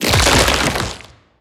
cage rolling start

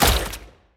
shoot 8 way; loki

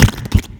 blobby wiggle

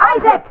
mom muffle

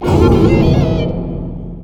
charge up; satan

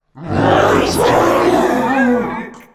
mother appear; finalmother

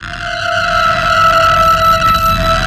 light ray charge up; dogma

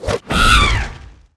siren lunge attack

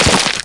shovel dig

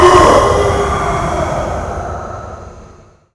mom dash; mothershadow

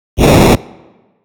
black hole shooting; dogma

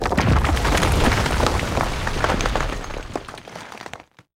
ground tremor

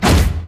suplex activate

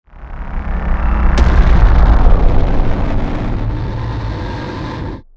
black hole closing bursting; dogma

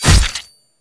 knife pull

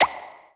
slipped rib deflect

